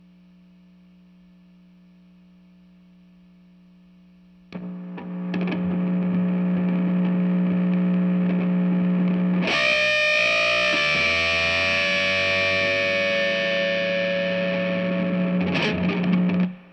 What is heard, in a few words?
GTR